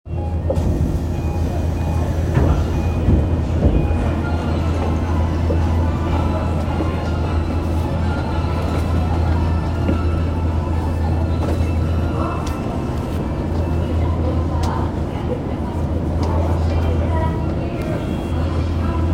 東西線日本橋西, train sounds

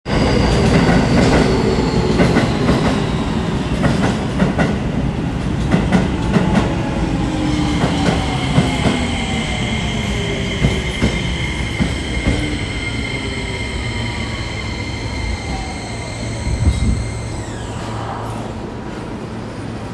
京王線府中西接近

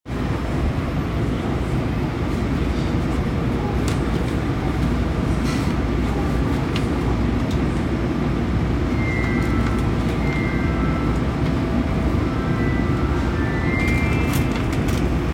日比谷線南千住北

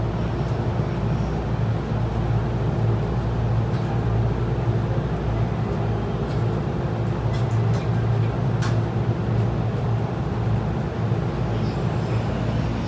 JR埼京線十条北, train sounds

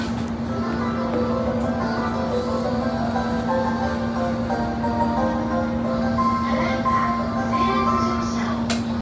JR京浜東北線日暮里北, train sounds

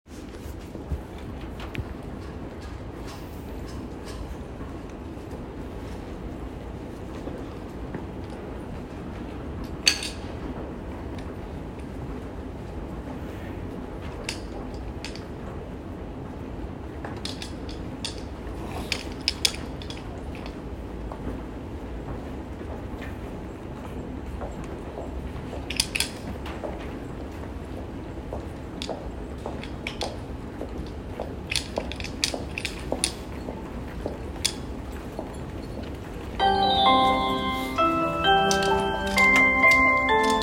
小田急線千歳船橋東接近

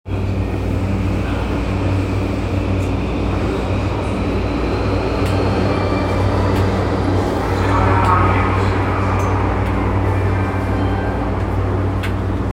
半蔵門線永田町西, train sounds

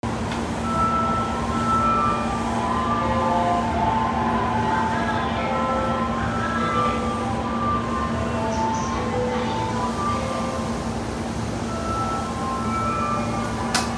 京浜東北線赤羽北, train sounds

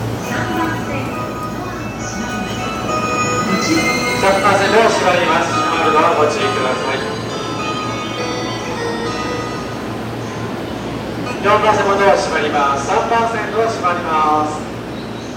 中央線御茶ノ水東, train sounds

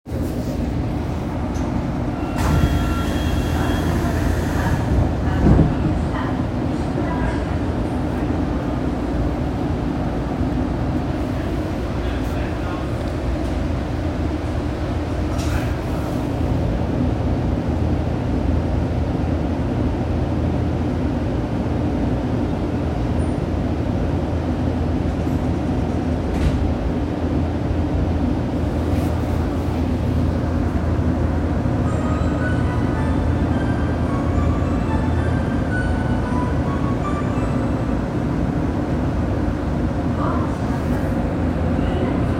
東西線妙典東, train sounds

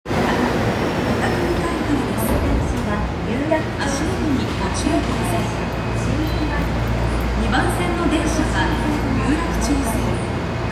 有楽町線小竹向原東, train sounds

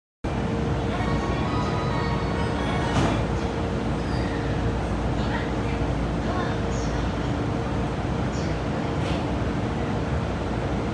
JR 常磐線 南千住 南, train sounds

